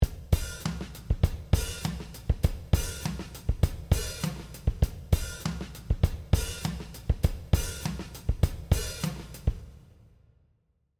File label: Drum; Phoenix